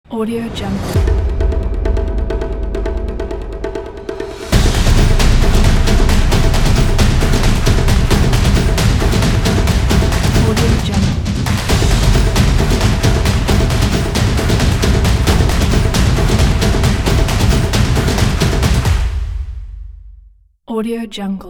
epic drums logo pack; mrclaps

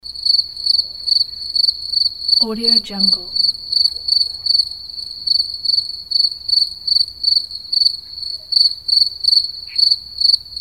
cicada loop

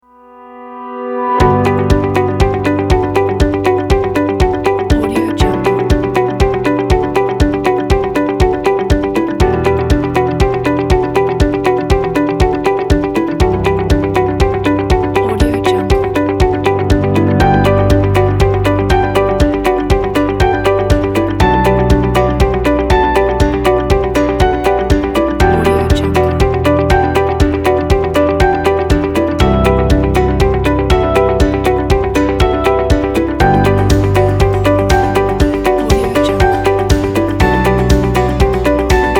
corporate upbeat; corporate upbeat kit; densostudio

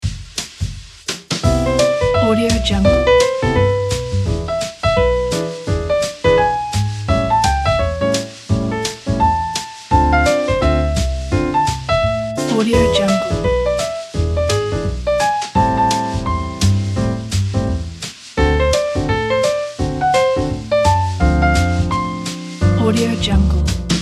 standard swing jazz piano

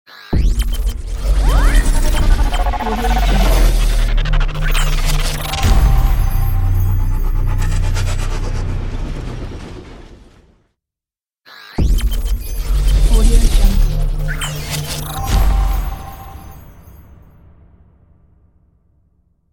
logo technology

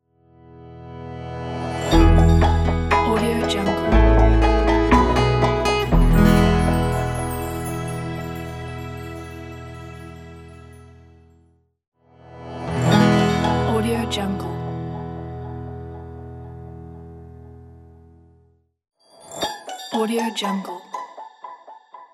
serene logo

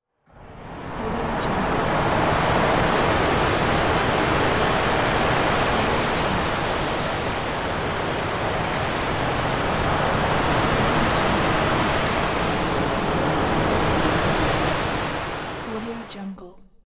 sea waves ambience

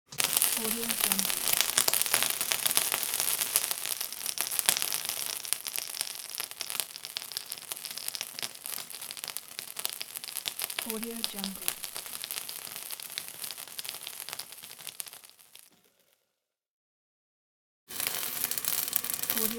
sizzle

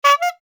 high bulb horn single honk

